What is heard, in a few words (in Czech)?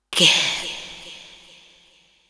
Vzdech